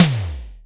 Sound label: Electronic Tom, Drum Machines Roland R-5